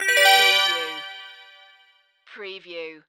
Multimedia Chime

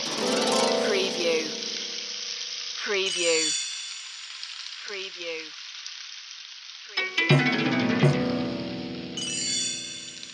jungle sanctuary loop

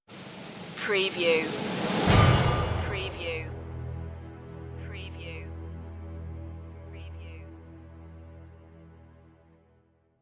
attacking empire stinger

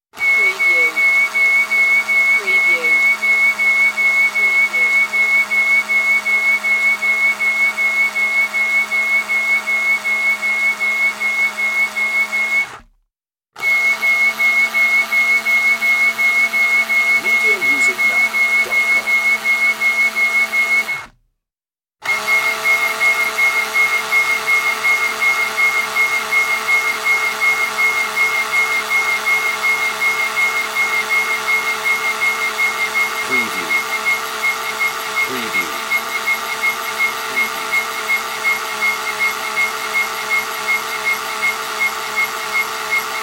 whistling screw

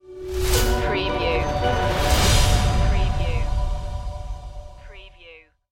visible world stinger

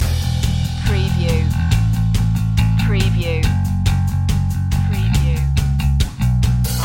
garage jam loop

